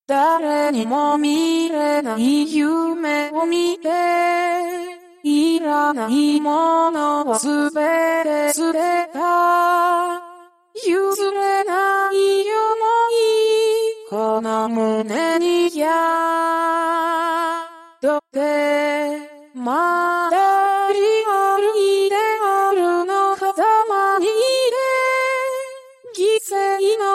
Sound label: acapella; UTAU